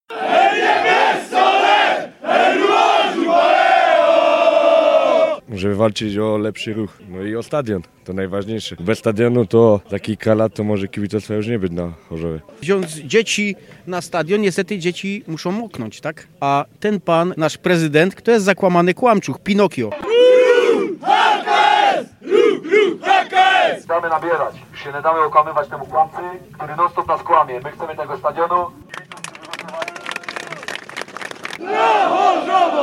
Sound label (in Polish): kibice